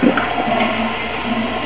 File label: flush